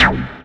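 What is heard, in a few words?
percussion FX, Dance Drums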